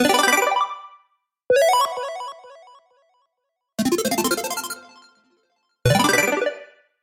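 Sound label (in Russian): zvuki dlia uvedomlenii na telefon v stile 8-bit, 8-bit style phone notification sounds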